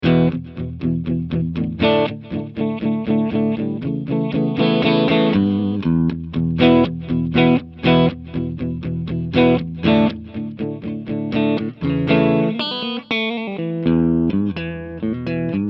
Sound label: gain 2 deep switch